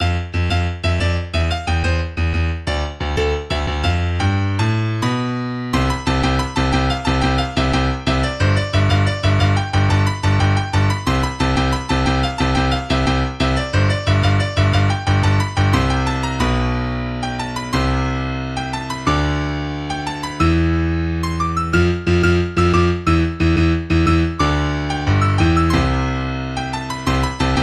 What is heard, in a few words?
Piano Version